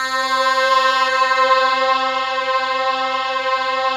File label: Microwave; Optical Media International; Sonic Images Library; All Micros